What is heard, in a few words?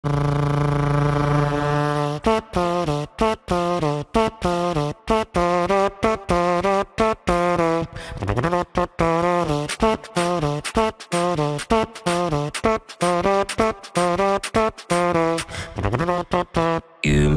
beatbox cover